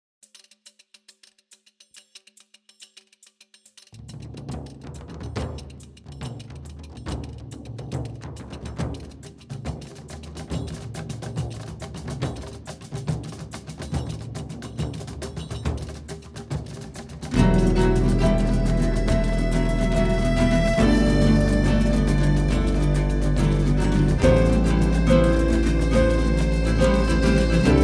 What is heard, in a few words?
Folk Jazz